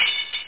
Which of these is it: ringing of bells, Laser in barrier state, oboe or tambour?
tambour